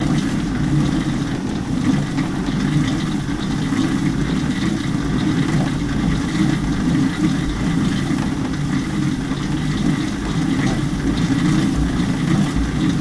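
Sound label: Exercise Bicycle Pedal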